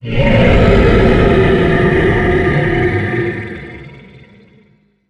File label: monster roar